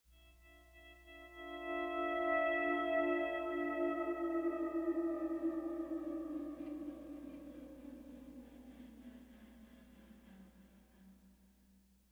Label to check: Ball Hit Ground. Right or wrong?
Wrong